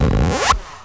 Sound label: FX; AMG Megabass REMIX! VOL-2